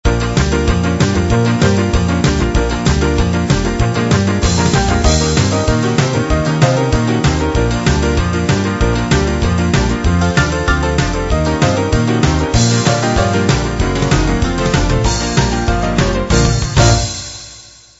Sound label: blues rock